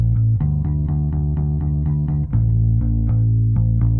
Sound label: bass; musdb18